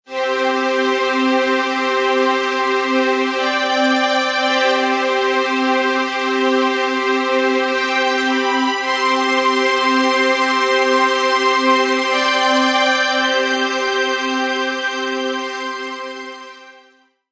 Orch Strngs C, Orchestral strings loop C Major 110 bpm.